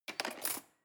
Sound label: cutlery cake fork drawer scrape